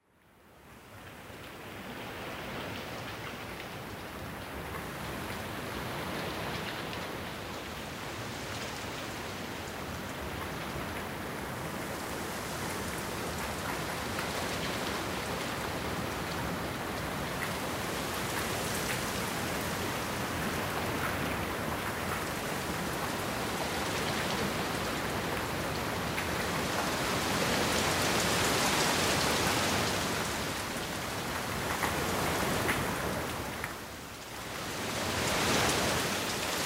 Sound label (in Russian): dozhd veter